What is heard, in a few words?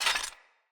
Foley Action Metallic Punch; Arm